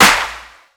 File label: verby hand clap D key; snare drum alternatives